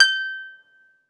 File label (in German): HARFE G3; Best Service